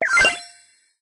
get pp accent; Brawl Stars

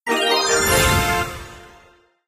power points any player; Brawl Stars

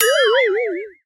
die; Brawl Stars

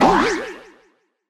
ulti; Brawl Stars

